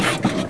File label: SQUEAK; Matrox M3d